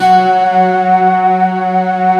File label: baroque F#3; East West; Global Sounds